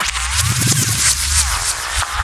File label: Rewind; Jungle; FX; Blu Mar Ten - Jungle Jungle - 1989 to 1999 Samplepack